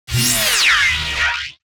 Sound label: Laser; Minora-Game; Sound-Artlist